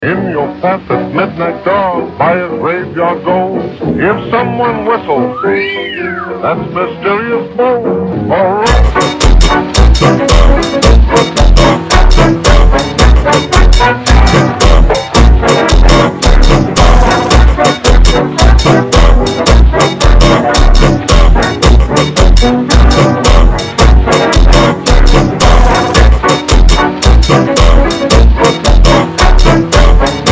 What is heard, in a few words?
FUNK Super Slowed